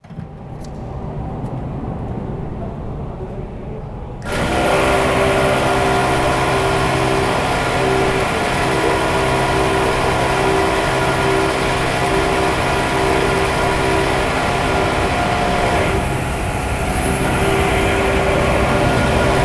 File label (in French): public